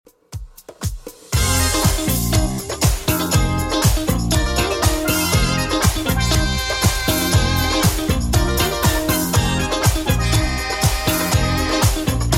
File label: 80s Redrum 120 bpm CLEAN